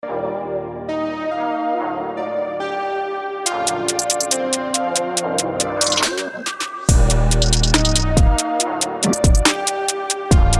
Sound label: moodytrap